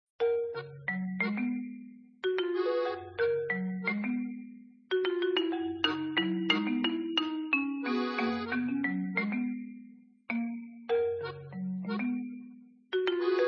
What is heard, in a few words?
bgm